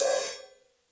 crash choke very quiet, DRUMS (SINGLE HITS), Crashes